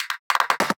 Beatbox Fills 150, Hybrid Beatbox Drums; Antidote Advent

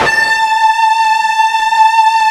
FUZZ A 4, Guitar; East West; Steve Stevens Guitar Samples Collection

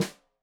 Snare Stereo, Drums; Deeply Sampled Drums; SMDrum

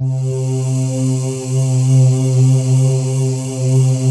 VOCAL SWPS; Pro-Rec - Synthsonic; JV FILM

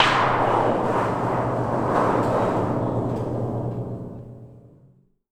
PIANO LIGH

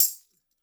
Tamb; InVision Interactive; Percussion Slam

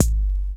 DR. LOOP; X-Static Goldmine 3

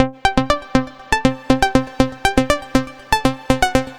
Delay Lead, Synth Textures; Sonic Foundry (Sony Creative Software); The Electronic Music Manuscript Collection

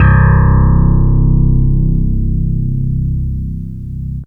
Single Electric Basses, Dance Floor Bass

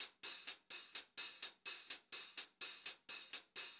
126 HH, Pop-Rock Drumloops; USB Soundscan vol.37; AKAI

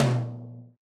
RX8 Tom, Yamaha RX-8

